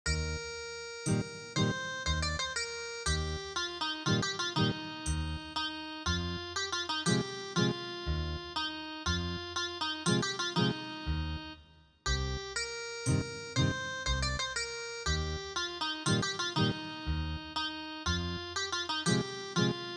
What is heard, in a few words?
Ethnic Guitar; geom jil mae gi